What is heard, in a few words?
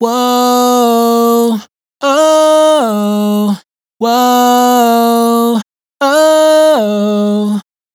riff whoa oh 120 G#m, Dry Vocals; Raw Vocals; Slate Digital Omnivox Vocal Sample Pack